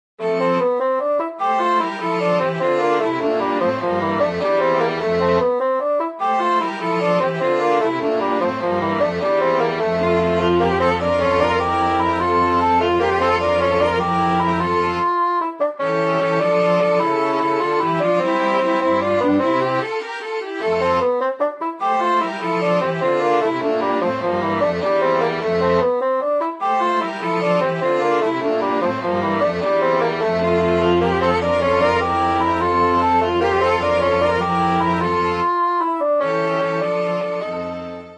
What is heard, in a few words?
Quartet